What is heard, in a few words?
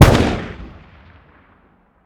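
weapon ar fire player atmo exterior; gmod; viper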